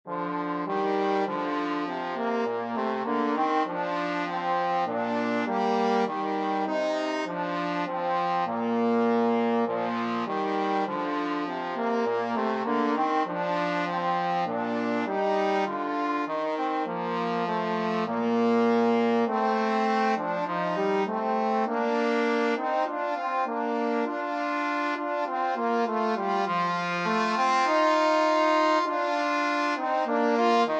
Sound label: cwm rhondda trombone duet